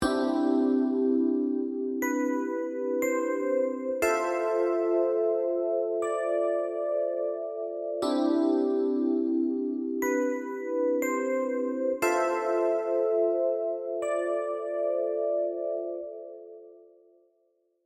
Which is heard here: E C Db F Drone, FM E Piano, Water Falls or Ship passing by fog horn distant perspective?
FM E Piano